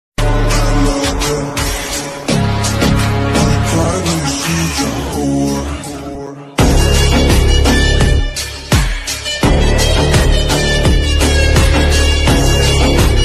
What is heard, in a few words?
Instrumental ringtone